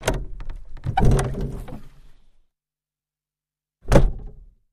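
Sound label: Carriage Door Interior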